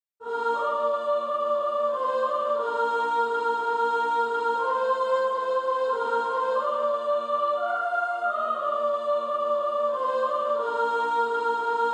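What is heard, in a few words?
Female Choir, soprano 1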